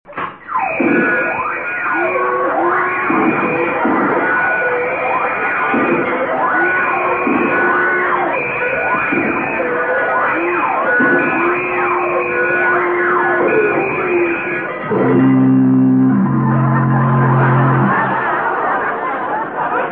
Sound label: Vault Alarm